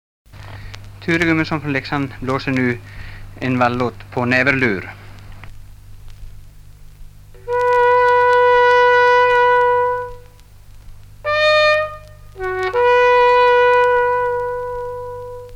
Naverlur

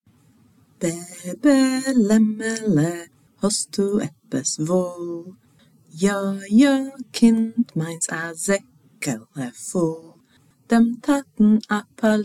Ba ba vita lamm, Jiddish

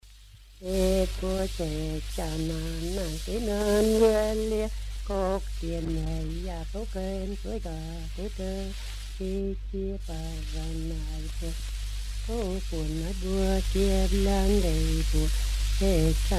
sin mors jojk; folkminnen

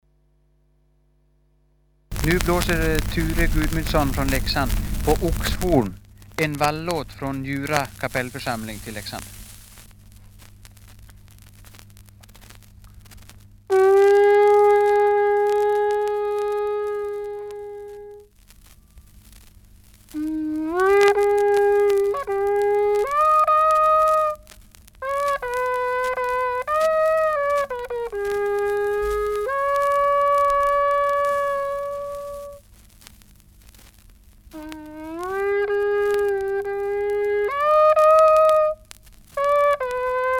Oxhorn

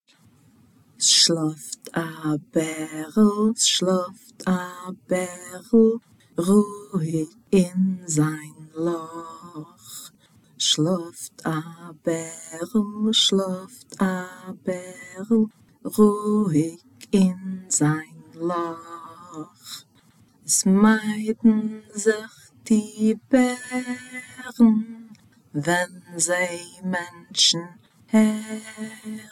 Bjornen sover, Jiddish

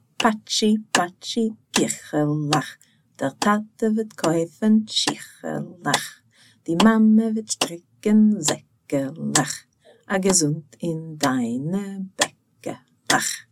Patshi patshi kikhelekh med klapp, Jiddish

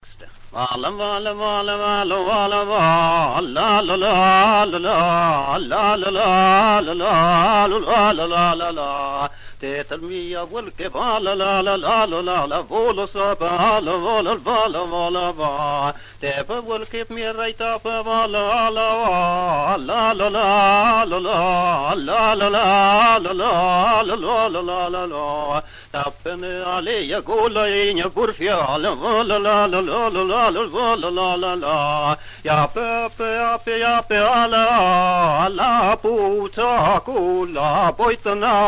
jojkar om en vuolle från södra Arjeplog; folkminnen

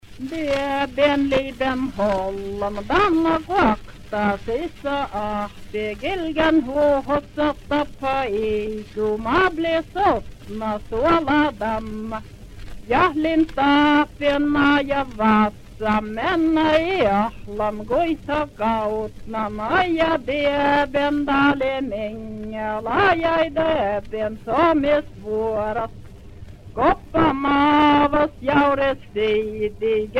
Vuolle om betesmarker; folkminnen

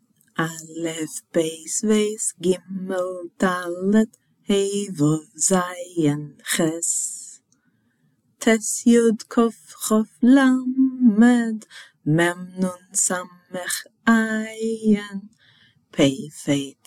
Alef beys sang, Jiddish

